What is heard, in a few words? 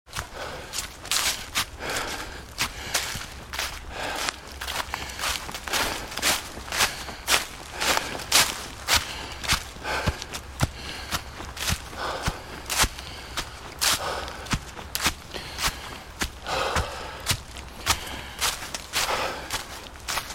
Hiking, Energetic and slightly out of breath man hiking through rural countryside and wood; Energetic and slightly out of breath man hiking through rural countryside and woodland.; Exercising